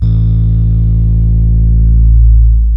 ROADZ A0 MED, Suitcase E.P; E-MU Producer Series Vol. 2 – More Studio Essentials; Keyboards

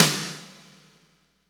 SNR, Roland; Real Snrs

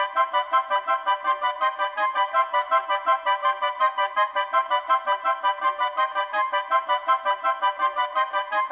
Winds, Orchestral; Sonic Foundry (Sony Creative Software); Orchestral VOL-2

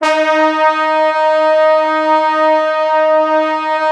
D#3, Orchestral Brass; Best Service ProSamples vol.20 - Orchestral Brass; AKAI

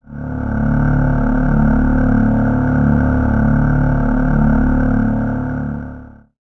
choir D1, MIRAGE; ProSamples vol.55 - Retro Sampler; Best Service

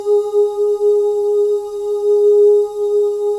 BGV OOS; Spectrasonics; Symphony of Voices

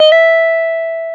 Jazz Guitar; Club-50 - Foundations; Jaz-Kessel

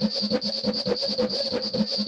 NEW RYTHM7, Yamaha VP-1, NEW RHYTHM; Music Store

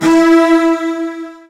E3, Strings; Virtuoso Strings; ILIO; Kirk Hunter Virtuoso Strings VOL-2; 90 s Sample CDs

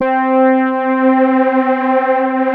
FAT LEAD, Powered DX; Wizoo

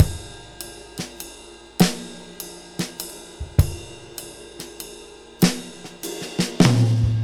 6/8 Loop, Drum Tools, Laid Back

